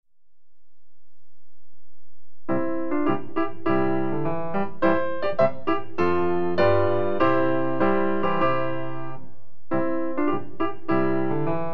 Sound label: shika instrumental